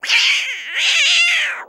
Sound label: Cat Angry Squeal